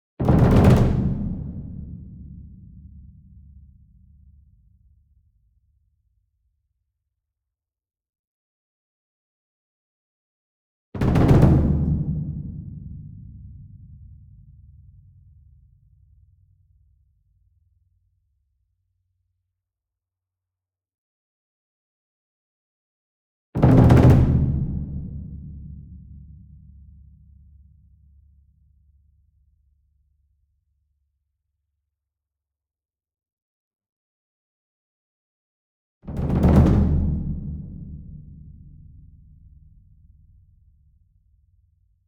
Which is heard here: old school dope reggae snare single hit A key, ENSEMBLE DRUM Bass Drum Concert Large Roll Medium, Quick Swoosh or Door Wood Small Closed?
ENSEMBLE DRUM Bass Drum Concert Large Roll Medium